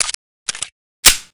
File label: pistol reload, Beretta; HL2-Nerv